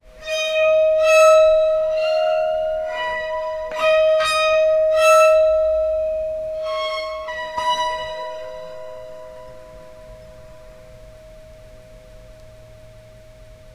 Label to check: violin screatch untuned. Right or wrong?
Right